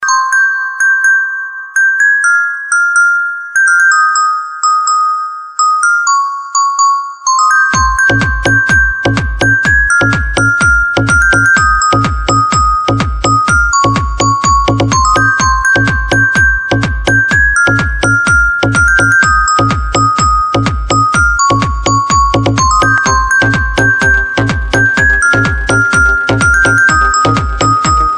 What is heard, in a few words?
Ringtone Mobile